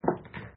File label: pocket, Snooker